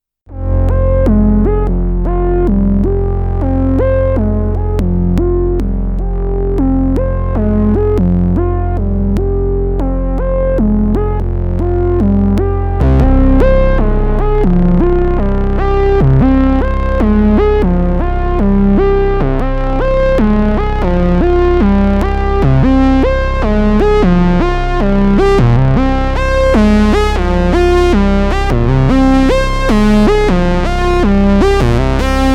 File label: Vermona 14 Synthesizer Solo